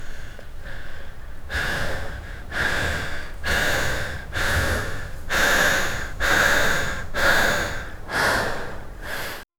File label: a road cyclist breathing; cycling